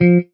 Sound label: marimba; ゆめ2っき